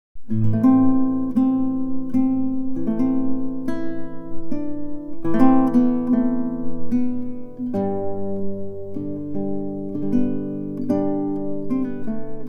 Guitar

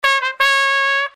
brass loop

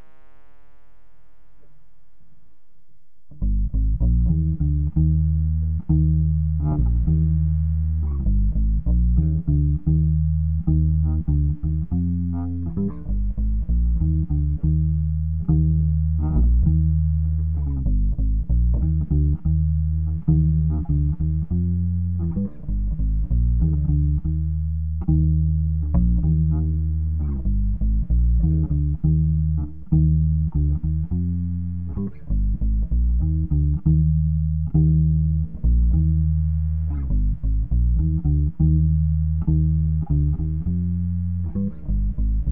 Acc GTR chillgroove; D GTR-chillgroove by Javolenus

